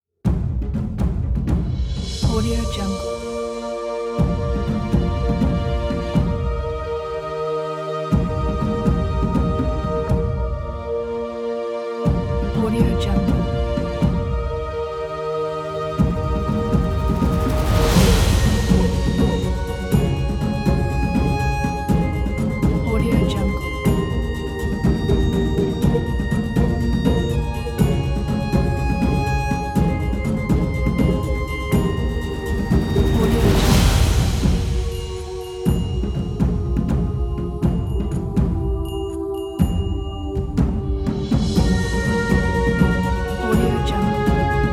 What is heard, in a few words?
nature documentary